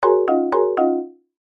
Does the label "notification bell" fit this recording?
Yes